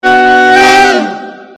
iphone noir

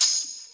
tambourine

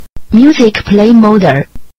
music play mode

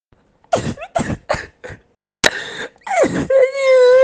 orc crying; orc peon

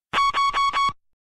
beeper

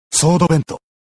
sword vent

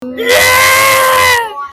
yell

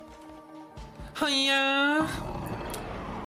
hooyaah

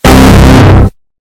windows xp error bass boosted

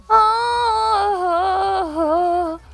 song

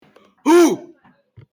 ho lalala

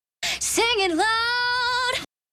sing it loud

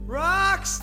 rocks

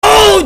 ouuuuh

